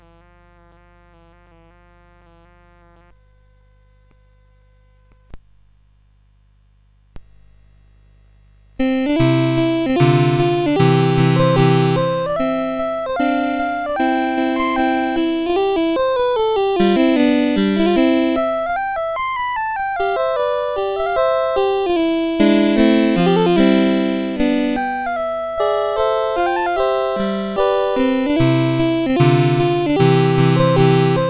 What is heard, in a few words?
Verstecken CSG8580R5; Joseph A Grau; Les Petits Riens